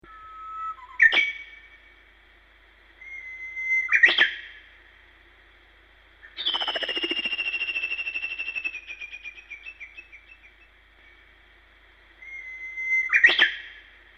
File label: bird